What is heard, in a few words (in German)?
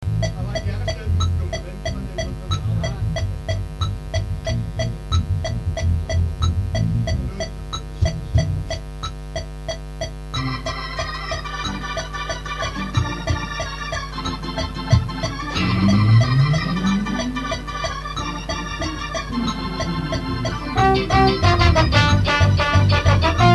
ohne Schlagzeug 184